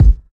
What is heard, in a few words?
deep steel kick drum sample g sharp key, kick sound tuned to the G# note